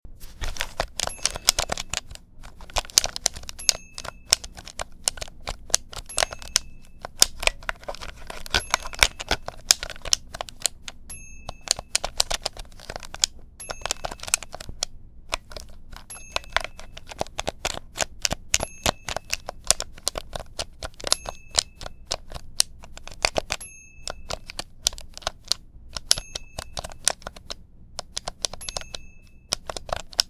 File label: Video Game Controller